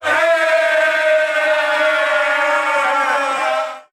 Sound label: scream group of men; ooh ahh